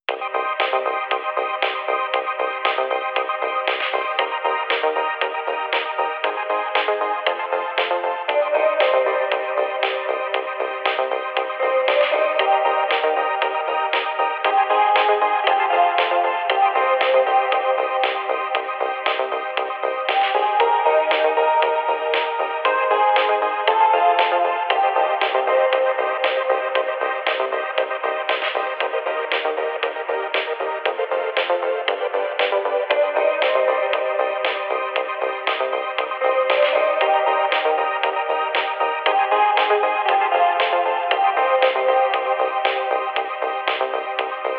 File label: disco 80s